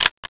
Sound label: button click, UI; svencoop; paranoia